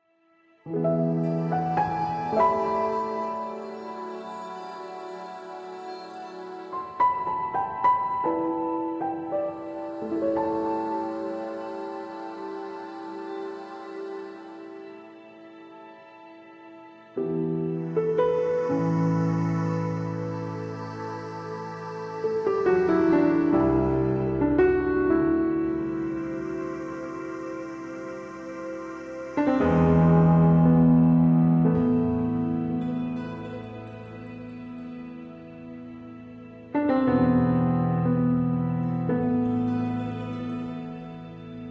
Snow BGM Loop; Holiston; AFK Journey; Android

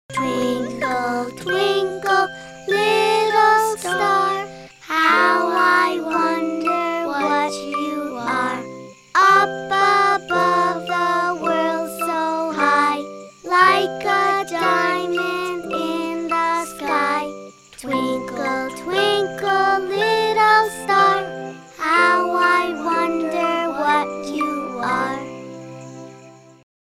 Twinkle Little Star; Nick Jr. Radio

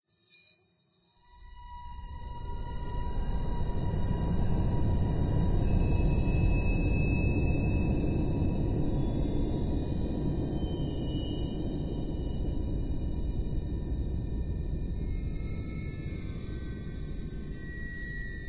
Bg metallic, Sfx; Flashback2

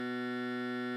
Fluorescent Buzz; Hidden Deep

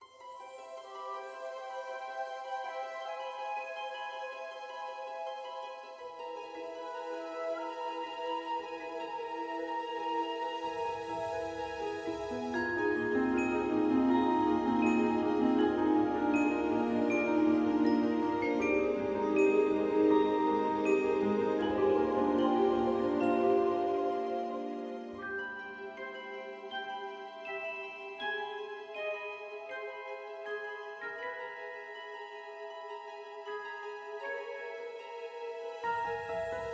Loop; Disney Spellstruck

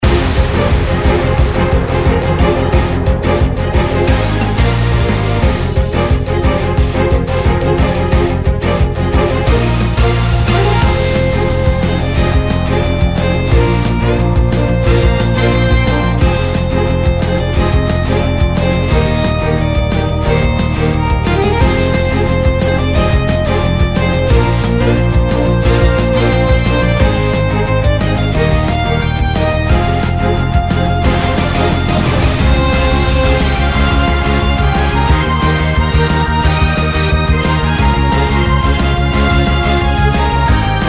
Raid Boss; Mist Train Girls

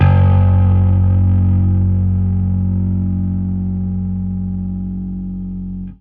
Fuzz F sharp 2; torchlight iii; ps4

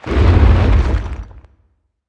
Escapee Tunnel Reveal Near

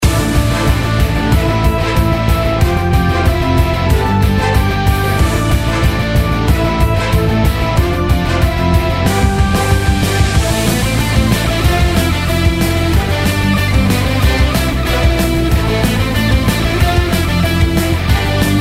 Raid Boss; Mist Train Girls

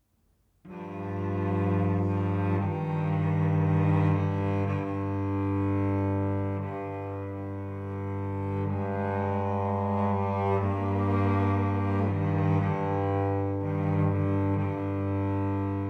Savannah Music

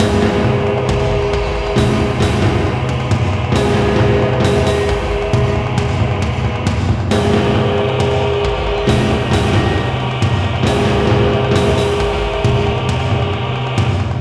Danger Loop; Aces of the Galaxy; XBLA Windows Xbox 360; Ice Field

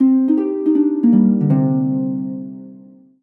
Solo Harp Negative

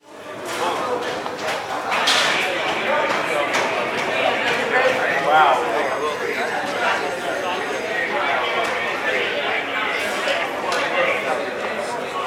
Crowd Neutral; Prison Architect

